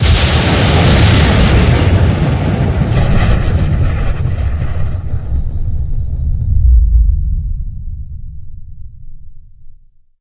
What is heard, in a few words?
Piezo Really close, Explosions; DynamicEuropa